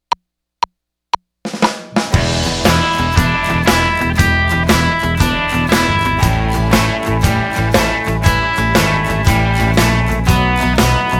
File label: 8 Bars Of Blues